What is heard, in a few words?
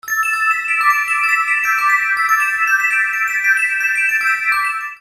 snow